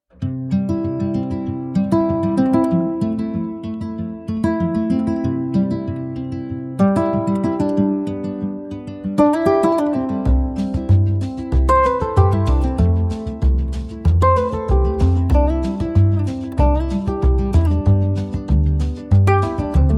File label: acoustic